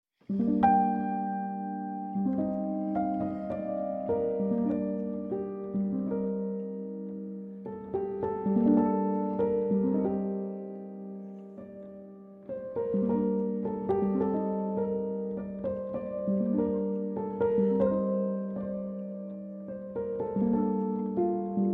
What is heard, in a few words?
Performed on Piano